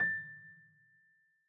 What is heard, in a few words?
piano sampler